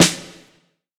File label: roomy acoustic snare sound f key, Royality free steel snare drum sound tuned to the F note.; snare drum samples